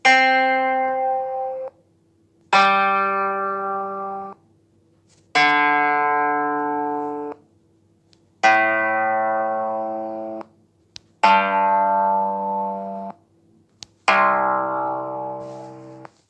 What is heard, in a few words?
oud